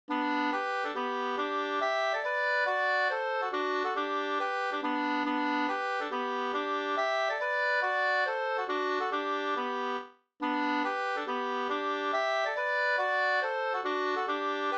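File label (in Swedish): polska, tvåstämmig; Ludgo Sd; Hilma Soderstrom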